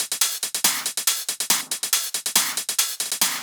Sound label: drum Loop 140BPM Full